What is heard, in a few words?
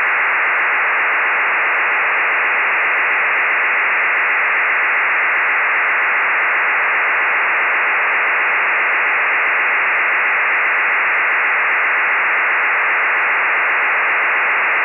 MIL-STD 188-110B 2400 Bd QAM (SERIAL)